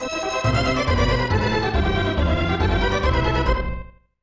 Unused Jingle; 7th Saga; NES rip

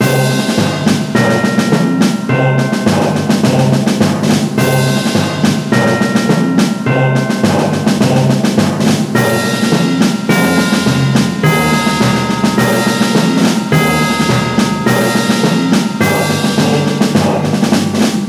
Army Music; Birthday Party Bash; Wii; reveal music

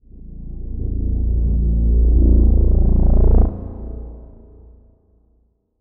Bass reverse; CS OST PurpleSkullsTheme; SLICE; crossing souls; ps4

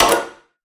Deployment Assign Guard; Prison Architect

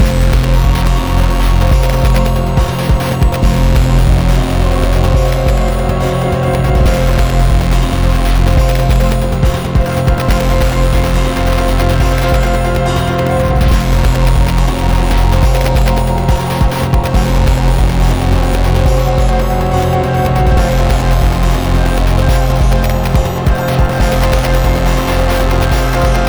Glitch sonata Medium; rive wreck hack die retry; ps4

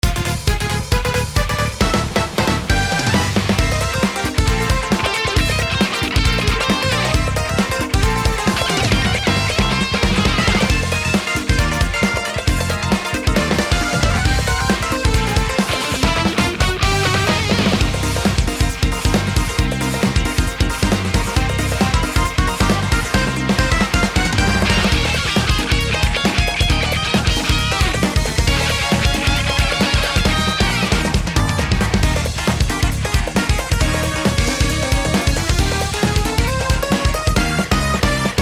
Minigame; paper mario the thousand year door; Pianta Parlor

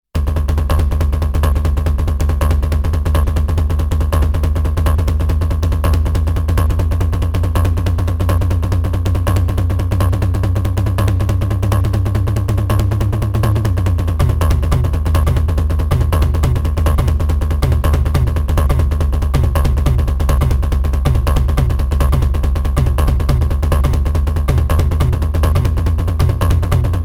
Electro Madness; Ridge Racer Slipstream; Android

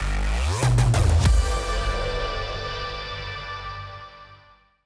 Jingle Mission Complete

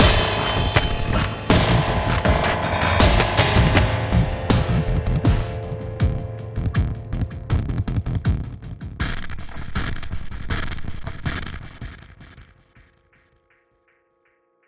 Boss Stinger; rive wreck hack die retry; ps4

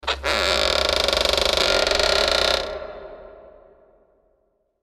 Scary Creak; F.A.M.P.S.; macos windows gamerip 2009

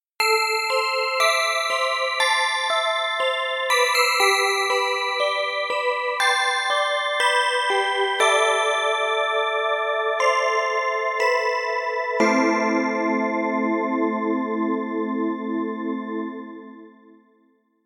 Sad Game Over; Next Hero

